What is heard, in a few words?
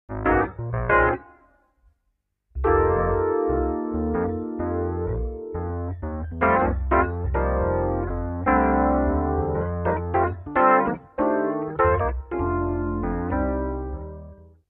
Boss CE1, fender rhodes